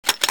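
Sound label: double barrel foley, weapons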